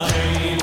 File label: pain